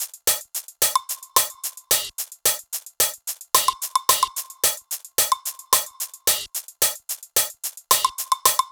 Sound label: Nu Disco 110bpm Top; Drums; Drum Kits; Stems; Ghosthack; Ultimate EDM Drums; Nu Disco Kit 09